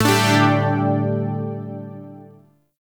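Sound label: Shawzin Pentatonic Major Chord; Chords; Warframe; Zariman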